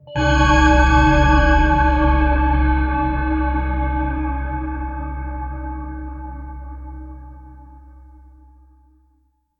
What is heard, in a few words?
a deep bell strike; symbol